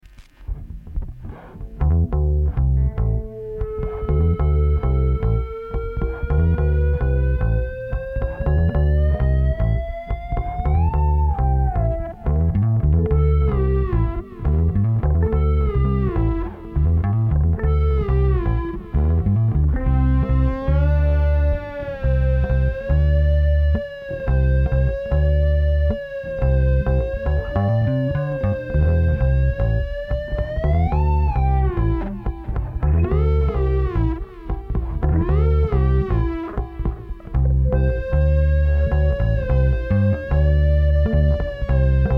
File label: bass and guitar